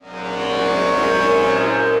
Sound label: Drone Expand Space, Pad; Ableton; Factory Packs; Punch and Tilt